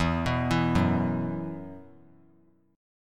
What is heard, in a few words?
E Suspended 2nd, down; Esus2 arpeggiated